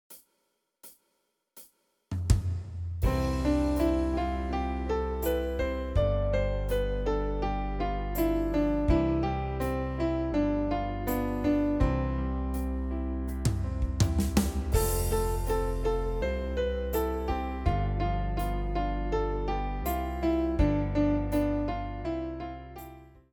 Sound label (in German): tonleiter spiel d